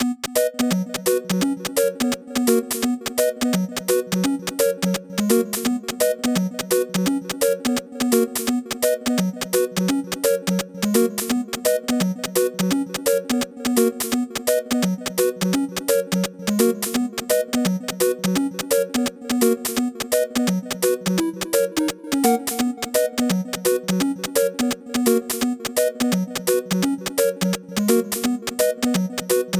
score board (loop); Jack B. Nimble

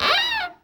Fx Chest Creak

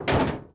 robot step

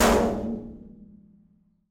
metal hit; teardown ps5 windows xbox series xs gamerip 2022

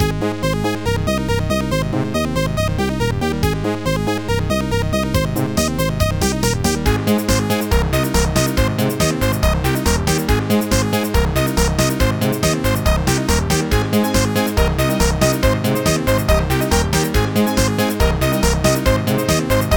Battle Variation

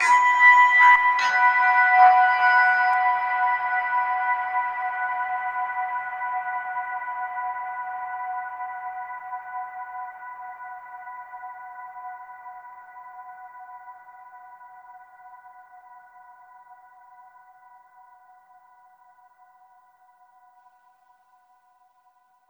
Overlay Tone

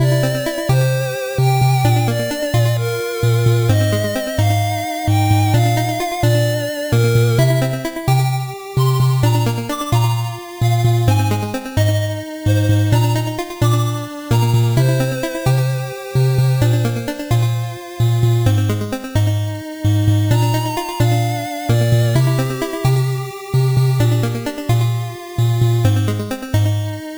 level up; Drancia Saga; 3DS

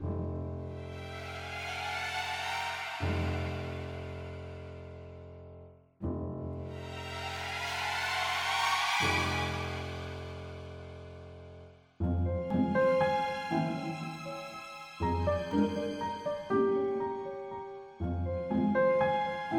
music boss cinematic intro

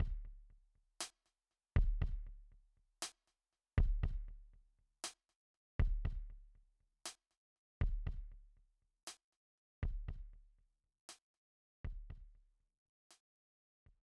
Elevator; Elevator 3 (Intro)

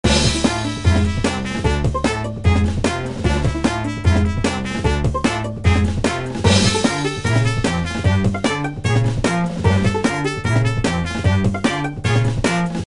Angry Music

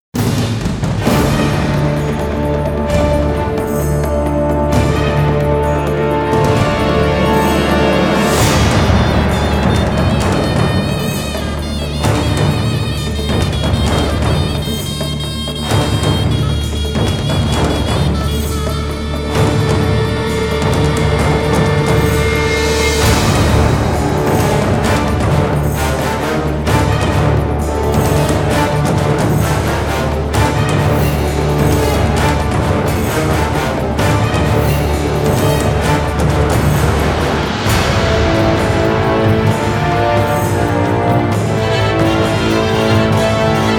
persian combat bonus track; God of War Ghost of Sparta

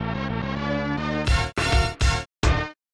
Mystery Jingle; Power Piggs of the Dark Ages; SNES

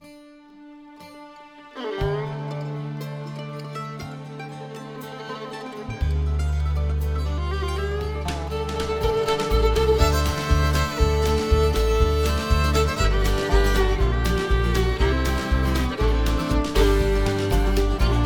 Radio Music

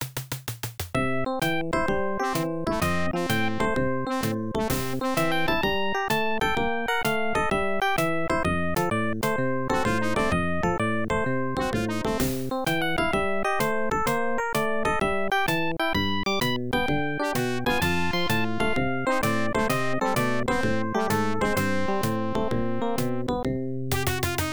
Tavern; Taikou Risshiden; Genesis

